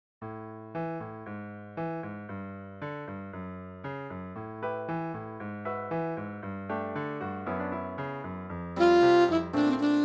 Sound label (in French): Tango Version sax.